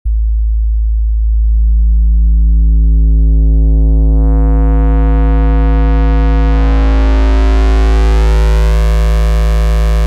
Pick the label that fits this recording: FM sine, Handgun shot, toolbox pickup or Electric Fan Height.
FM sine